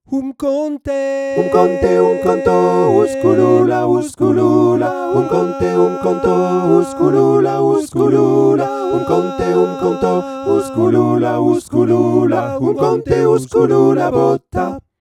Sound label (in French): 4 voix